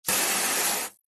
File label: garden hose spray water jet plastic bin; zapsplat